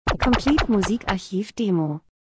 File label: transition; Transition & Movement - dunkel trocken Aufmerksam